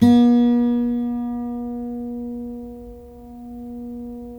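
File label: GUILD 12-string, Guitars; Spectrasonics; Hans Zimmer Guitars VOL-1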